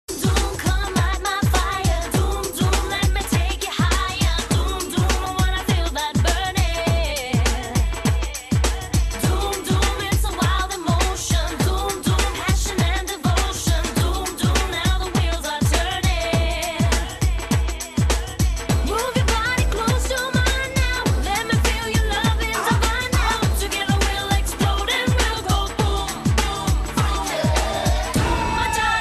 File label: Ringtone